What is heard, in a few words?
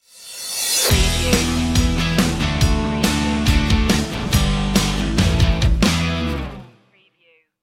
rolling free stinger